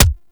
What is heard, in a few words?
Weapon Punch Shotgun; Weapons; Shotguns; Warframe